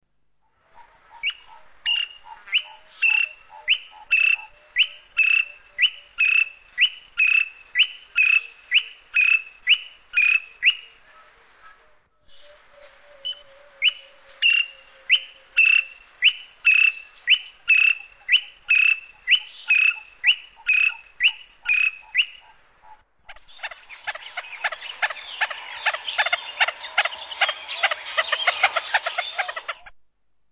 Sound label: Greater Honeyguide